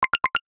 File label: alarm; LINE